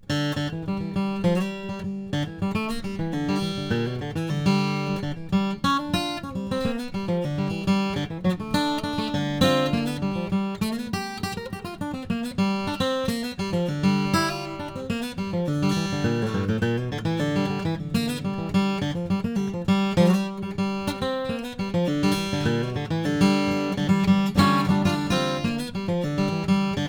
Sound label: Martin D-28, guitar; Chris Bozung; 1950